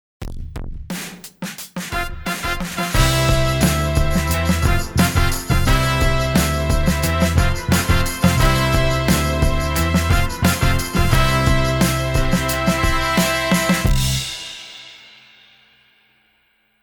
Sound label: Horns; New York